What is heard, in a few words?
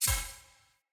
Melee Hit Critical; Weapons; Warnings